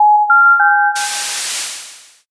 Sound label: modem